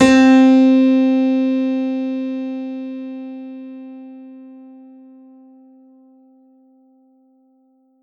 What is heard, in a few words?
yamaha mu90r concert grand c4